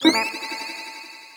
land mine primed; techies

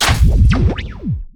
bomb plant; spoonman; dota; crownfall

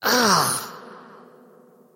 wolf attack emote; dota; crystalmaiden

